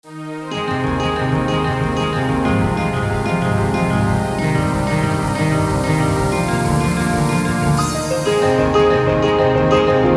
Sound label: melody